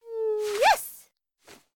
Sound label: pink Win Elbow; wildworlds